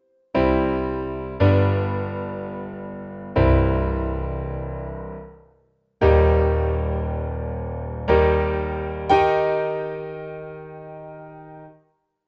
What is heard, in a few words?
Equal Chords After Just Chords